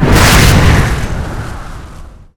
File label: death pulse; weapons; dota; necrolyte